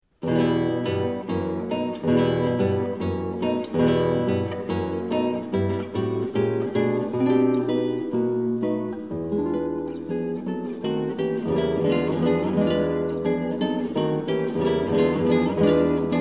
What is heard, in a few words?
Etude No 6 Poco allegro; Heitor Villa-Lobos